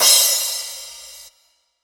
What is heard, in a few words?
Crash, Cymbals; Vengeance Samples